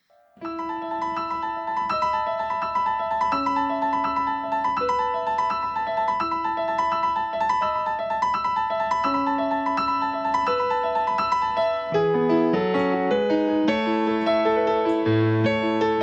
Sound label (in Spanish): PIANO INSPIRACIONAL